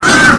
pain; xatrix; gek

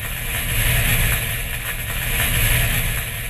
radar sparks; baseq2; fadersounds

